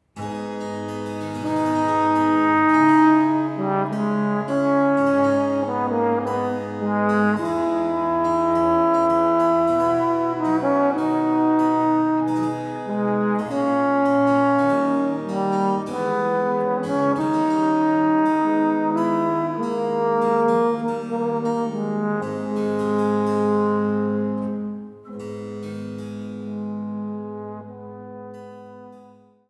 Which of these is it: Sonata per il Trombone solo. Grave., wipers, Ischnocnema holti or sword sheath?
Sonata per il Trombone solo. Grave.